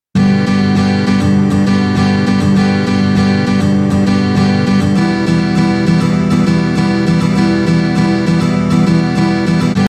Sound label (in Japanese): 16ビート, ギター; 16ビート④; ギター講師が教える初心者用16ビートストローク